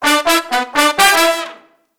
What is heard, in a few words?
Disco Fever, BRASS-LICKS